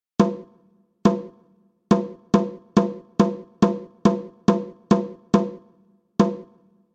werbel rytm; Nagrania Lokomotywy

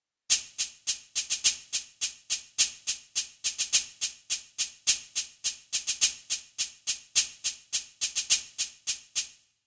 marakasy; Nagrania Lokomotywy

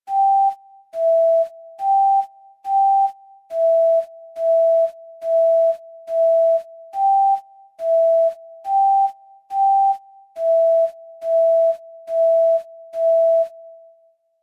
flet rytm; Nagrania Lokomotywy

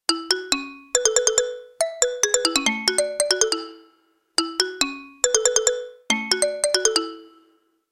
ksylofon; Nagrania Lokomotywy; edukacja wczesnoszkolna

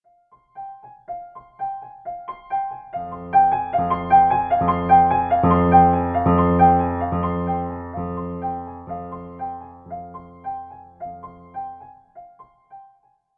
crescendo i diminuendo; Nagrania Lokomotywy

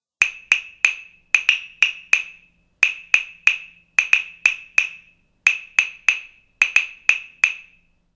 klawesy; Nagrania Lokomotywy; edukacja wczesnoszkolna

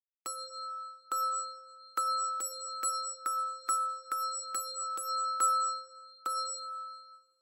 dzwonki rytm; Nagrania Lokomotywy

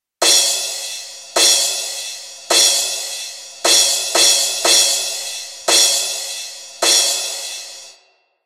talerze; Nagrania Lokomotywy; edukacja wczesnoszkolna

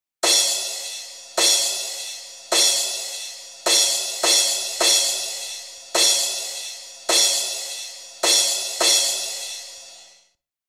talerze; Nagrania Lokomotywy

